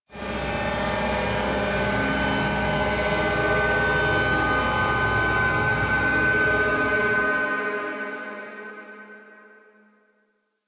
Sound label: Gas Storage Container Movement; Ambience; Warframe; Corpus Gas City